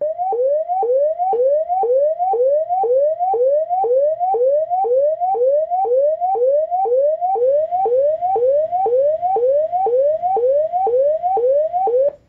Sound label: Bogen Siren